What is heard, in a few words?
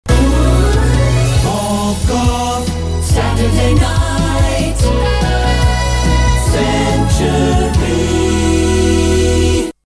jingle; Paul Gough, Saturday Night. This jingle was ordered after the initial launch package as a 'top-up'